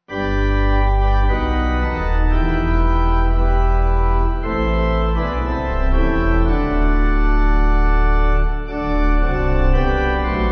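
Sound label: Pipe, Pipe Organ backing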